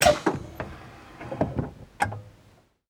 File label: BUS OPEN DOOR; SIMUZENSOUND